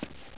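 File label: player step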